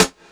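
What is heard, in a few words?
Snare one shot; Sonic Foundry (Sony Creative Software); Club Joints and Street Anthems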